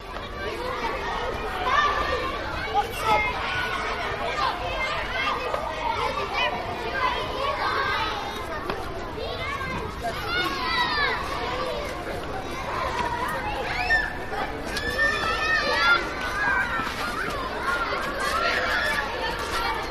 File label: Children Loop Play, kids play